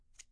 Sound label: Scissors Shears; UNUSUAL KITS and FX